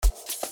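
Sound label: trumpet music box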